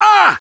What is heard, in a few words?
pain; quake2; caleb